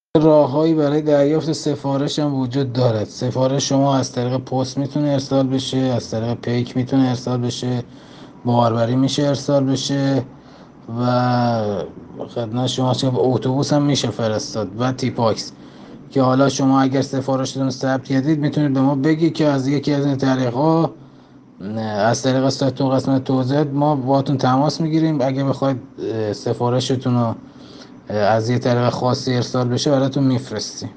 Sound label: audio traditional